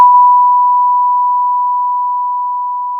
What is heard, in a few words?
pop, Sound of a church bell